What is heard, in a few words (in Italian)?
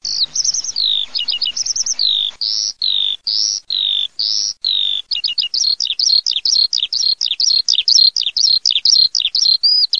allodola, birdsound